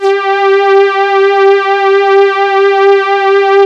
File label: SYN BOW STR, ENSMBL; AKAI S-Series CD-ROM Sound Library VOL-8